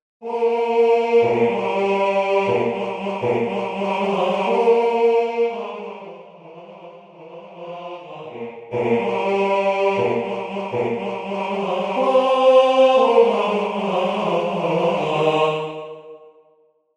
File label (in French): basse, chorale